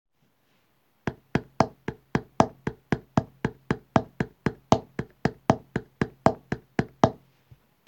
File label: rhythm